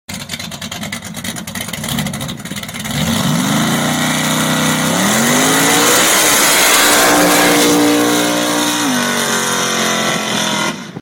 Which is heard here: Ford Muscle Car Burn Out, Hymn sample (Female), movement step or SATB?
Ford Muscle Car Burn Out